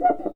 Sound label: CUICA; ILIO